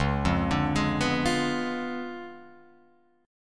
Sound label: C sharp 7th Flat 9th, Guitar, Open F, C#7b9 chord; arpeggiated; JGuitar